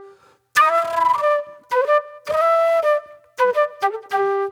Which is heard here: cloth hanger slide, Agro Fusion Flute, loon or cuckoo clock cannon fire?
Agro Fusion Flute